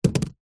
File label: food onion set down on wood table; zapsplat